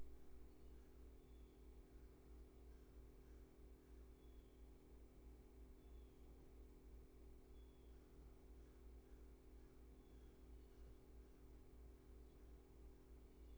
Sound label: roomtone